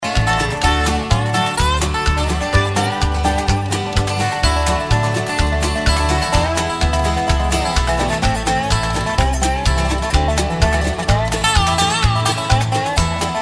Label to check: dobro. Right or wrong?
Right